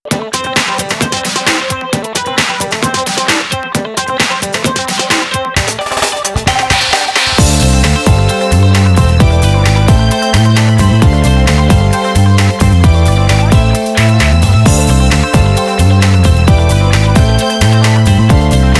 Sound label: instrumental